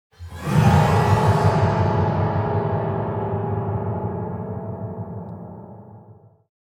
Poison Sequence Music Stinger

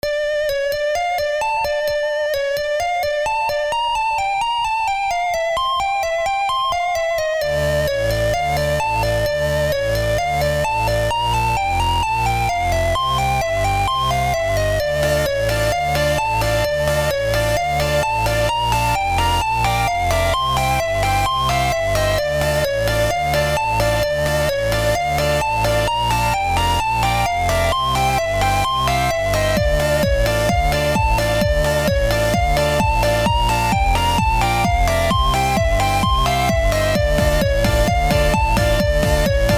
Highly Scuffed Triangle Beats; New Scuffed Epic Visual Novel Soundtrack

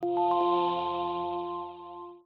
Air Dash Flower Hat

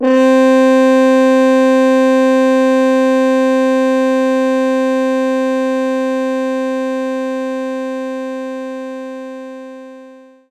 French Horn C3; knights try

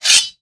metal slide metal; gamerip; shattered horizon 2009

